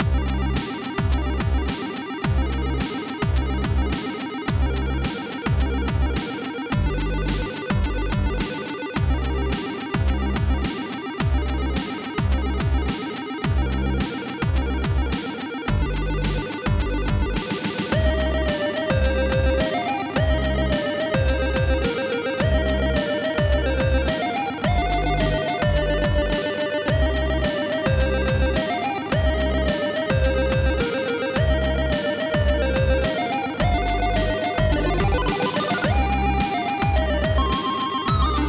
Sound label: big bass cracktro